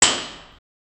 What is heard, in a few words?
constructor building